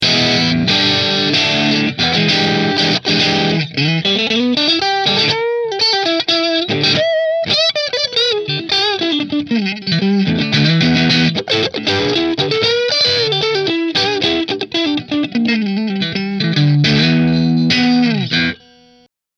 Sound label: Position 1 Marshall; Rahan Guitars RP Double Cutaway Green Flame Maple Top